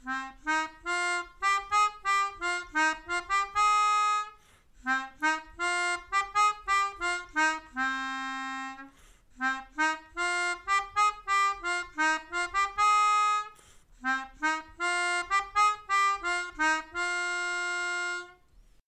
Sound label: Melodica